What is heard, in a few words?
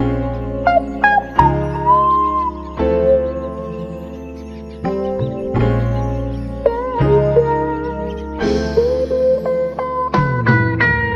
guitar no longer backwards, funky drum loops